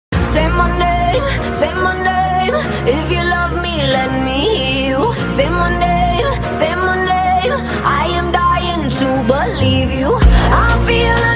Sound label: romantic english ringtone